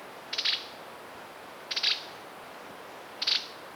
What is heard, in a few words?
Philydor atricapillus calls spontaneous